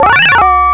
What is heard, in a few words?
Coin